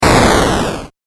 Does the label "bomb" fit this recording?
Yes